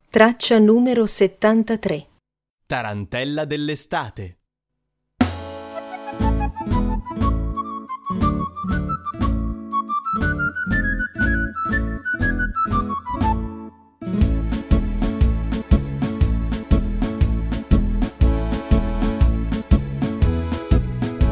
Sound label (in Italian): tarantella dell estate base; infanzia